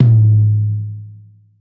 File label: low floor tom drum one shot a key